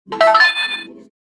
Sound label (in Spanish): sonido correcto; trivial pursit; mando de wii